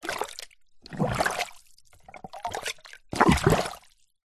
foley liquid plastic item movements in plastic container of water plunges